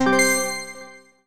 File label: Puzzle Success Keys Four Note Fast Wet Delay; Brawlout; ps4